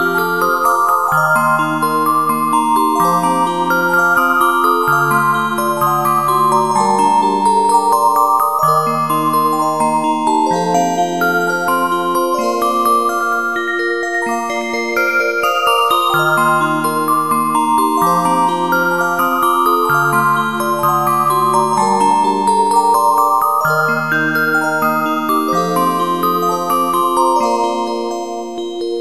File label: Music Box